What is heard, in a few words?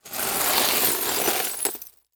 Glass Debris Glass Scraped In Pile; Crashes